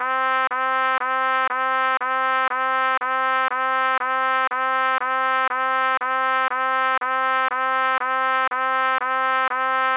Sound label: trumpet, Original Trumpet; Horn and Trumpet Repeating Middle C Notes